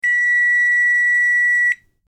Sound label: Microwave beep